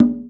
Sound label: Percussion, House